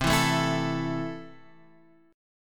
C 6th C down, chord

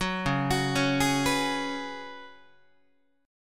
C sharp 7th Suspended 4th C sharp, Guitar; C#7sus4; arpeggiated; tuning=4A,4E,5A,5D,5G#,6C#; guitar chord

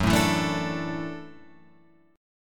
F sharp Minor 7th Sharp 5th, Guitar, Open F; strummed; 6,4,4,4,5,4

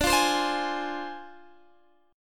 D Minor 7th Flat 5th Guitar Open D

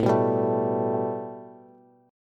A 7th Suspended 2nd Bass; A7sus2 strummed

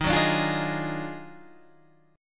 Eb Minor Major 11th, Guitar, Standard; EbmM11; strummed; JGuitar

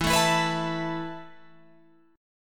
E Suspended 4th E, down, Guitar, Open F; Esus4; strummed

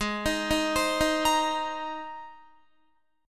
G sharp Major Add 9th G sharp, Guitar; G#add9 Chord

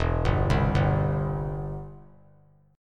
E Minor Sharp 5th, chord; Em#5 arpeggiated; JGuitar

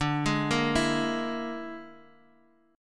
Bb 7th Flat 5th D

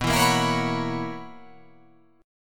B Major 7th Suspended 2nd Suspended 4th B

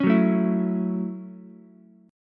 Gb Minor 7th Gb down Ukulele; Gbm7 strummed; JGuitar

